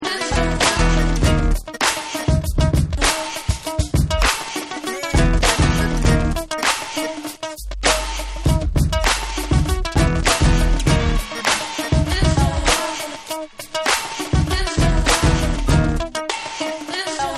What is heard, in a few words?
Instrumental